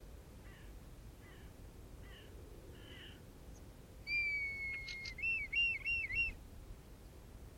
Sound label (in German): rotmilan